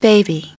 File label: baby